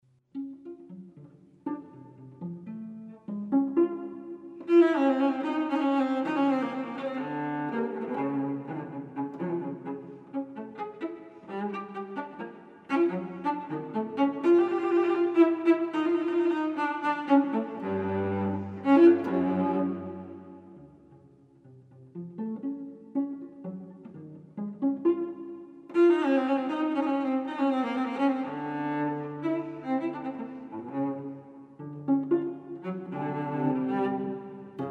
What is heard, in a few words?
Allegro alla scherza, Cello Solo